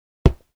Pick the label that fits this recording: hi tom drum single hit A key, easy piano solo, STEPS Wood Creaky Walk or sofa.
sofa